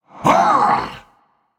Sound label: battlecry; smite; anhur